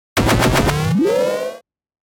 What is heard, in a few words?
Hiss Warble Laser; 5.1 STEREO MIX; Blastwave